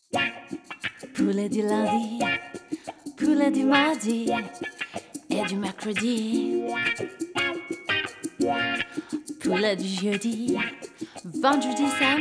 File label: BLUES